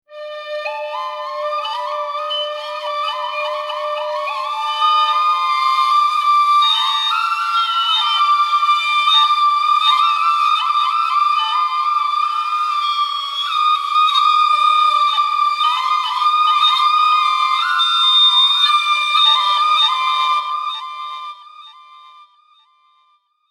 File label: Multiple Whistles, Multiple sound of whistles creating New Age soundscape transition.; Warbles; Sound Design